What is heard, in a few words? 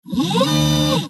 Robotic Motor; SoundsCrate